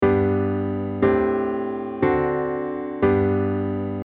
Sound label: G sus4